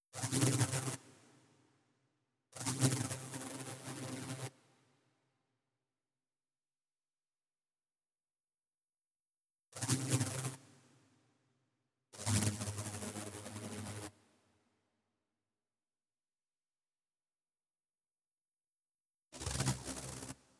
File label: Noise FX Ambient; Jumbly